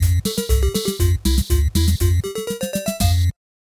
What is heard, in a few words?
intro song; toystory; woody